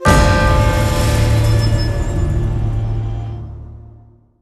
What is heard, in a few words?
Lose; kung fu panda legendary warriors 2008 wii